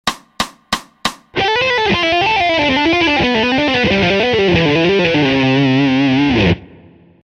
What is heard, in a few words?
Tapped Scalar Seq fast